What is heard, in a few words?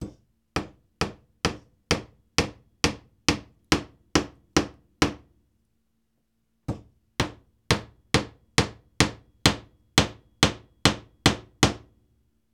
hammering